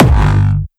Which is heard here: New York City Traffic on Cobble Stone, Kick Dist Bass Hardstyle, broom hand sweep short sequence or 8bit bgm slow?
Kick Dist Bass Hardstyle